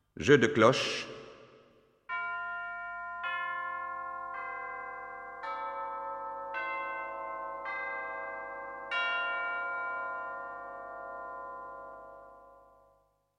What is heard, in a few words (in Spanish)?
Campanas